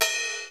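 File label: RIDE, Kawai r-50; Drums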